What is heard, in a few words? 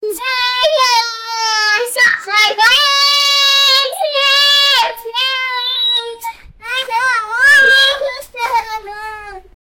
kids happy